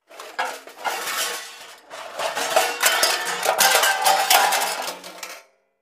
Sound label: Junk Search Through J, junk crashes; Junk Search Through Junk Pile